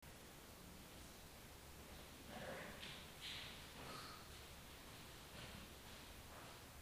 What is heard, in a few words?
static